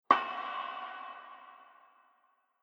Treated Metal Hit; Metal Sound Effects; Impact

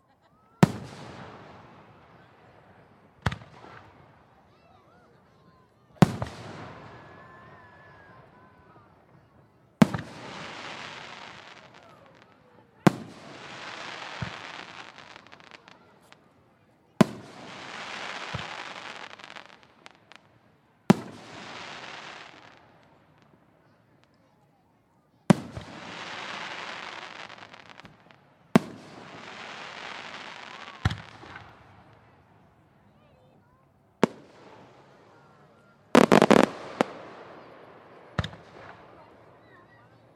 Fireworks Show, Ambience, Crowd, Walla; Blast Wave

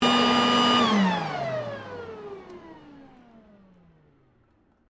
Vacuum Cleaner Outdoor Switch Off; Ryobi; Household Appliances

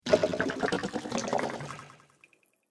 Water Space Bubbles, sci fi

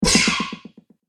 Scifi Weapon Shot, laser gun

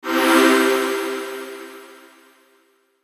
Sweeper Sparkle Delay; Production Element Imaging Element Accent Transition; Sparkle Sound Effects

